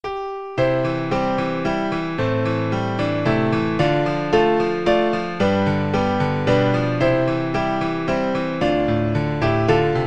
cader idris, piano

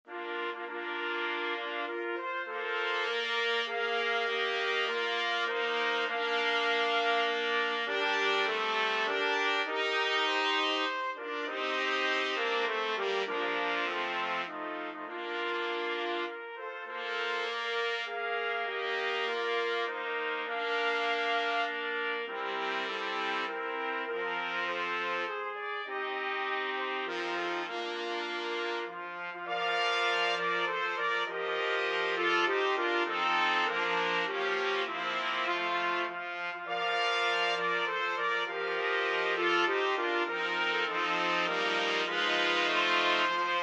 nocturne, 5 trumpet

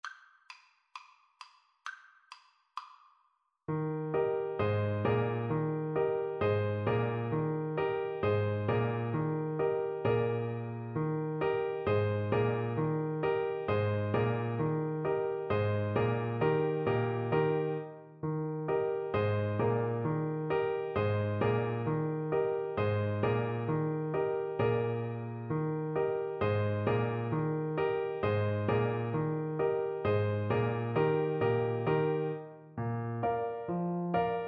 hornpipe, Clarinet

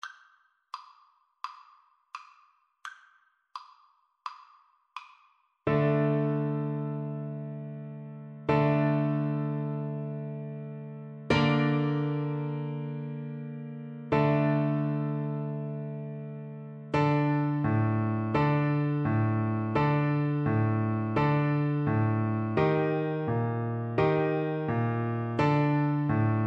hava nagila, Tuba

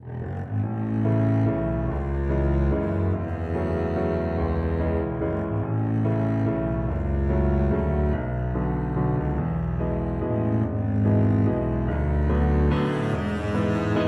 waltz double bass

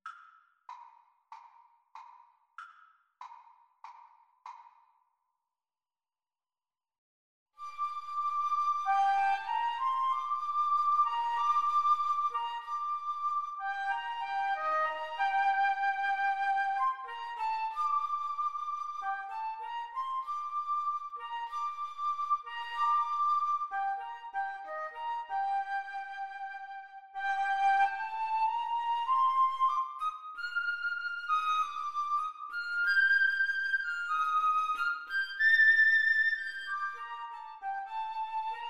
Flute Clarinet Duet

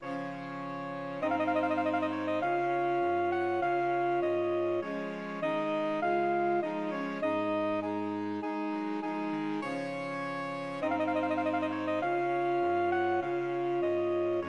trumpet voluntary SAX quartet

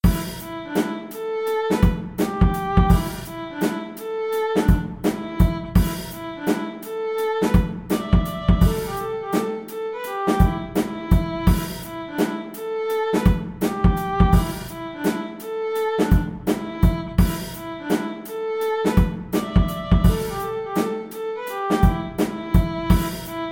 Funk Study, violin

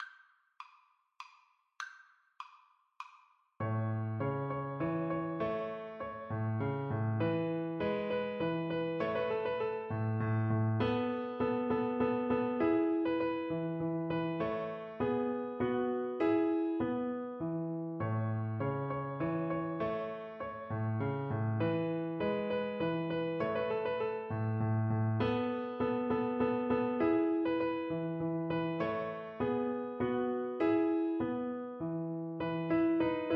Minuet, Cello

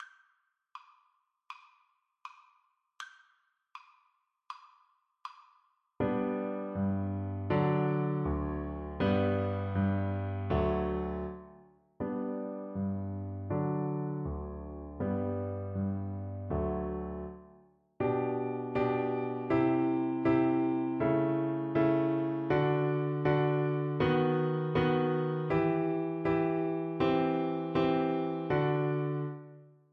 Concerto for Mandolin in C guitar

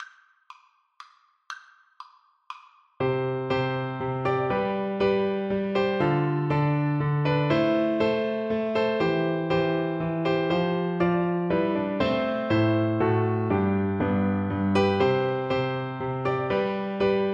chaconne, flute

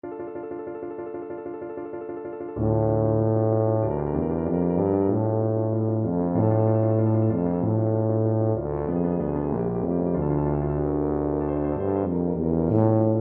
scene finale, tuba

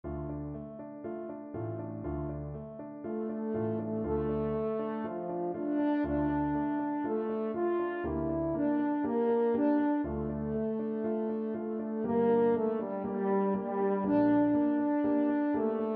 mend violin, french horn

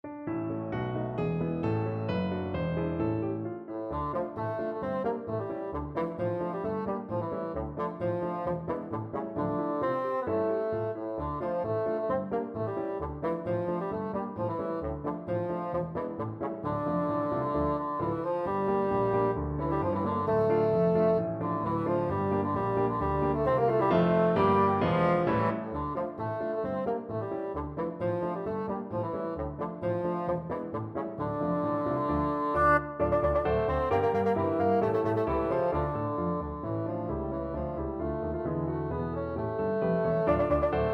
bassoon

